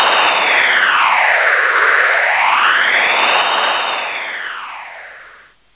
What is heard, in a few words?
Flyby